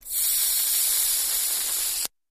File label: DC Arc Across Knife, arcs & sparks